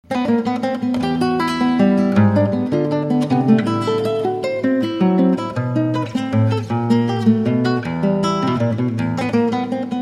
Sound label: valse